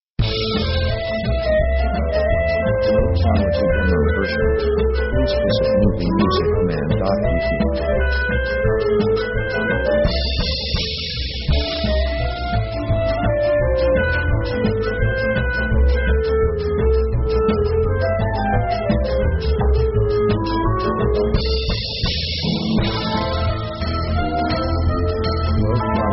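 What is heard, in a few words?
orchestra fast comedy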